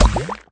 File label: Coloring Candy Burst; Candy Crush Soda